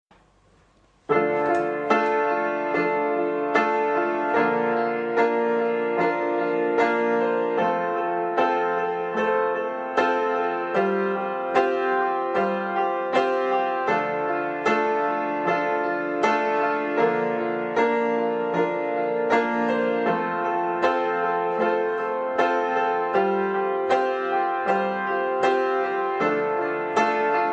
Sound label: piano